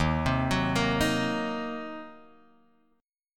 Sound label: D sharp Minor 6th, Guitar, Drop D; chordshape